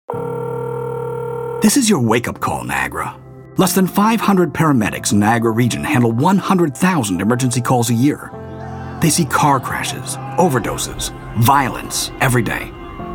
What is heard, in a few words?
Music stem